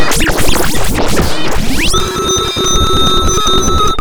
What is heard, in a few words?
loop, techno atmos